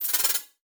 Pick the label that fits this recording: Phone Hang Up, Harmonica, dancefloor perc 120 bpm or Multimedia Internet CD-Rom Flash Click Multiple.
Multimedia Internet CD-Rom Flash Click Multiple